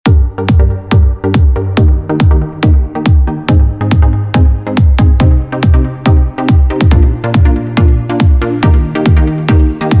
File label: techno; kick factory techno expansion